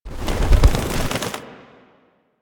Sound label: Bird Three Wing Flap; Warframe; Ambience; Entrati